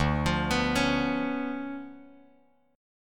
D Minor Major 13th, down, Bass Standard; DmM13 arpeggiated; JGuitar

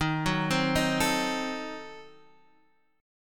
D sharp Diminished 7th D sharp; D#dim7 arpeggiated; x,6,4,5,4,5

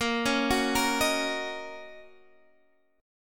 Fsharp 6th, guitar chord

